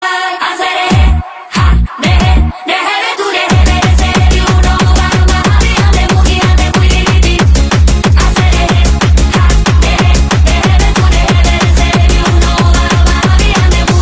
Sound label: TECHNO REMIX